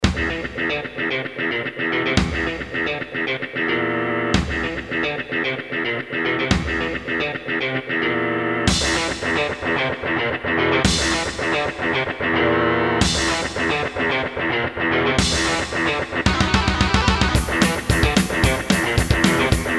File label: Casio GZ 50M; Doom e1m1